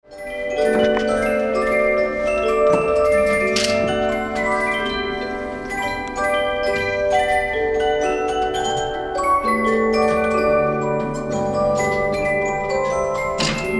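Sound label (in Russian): muz shkatulka, music box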